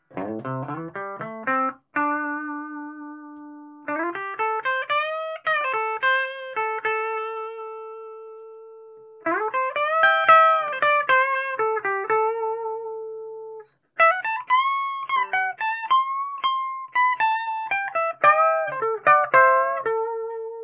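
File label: 335 clean; reverb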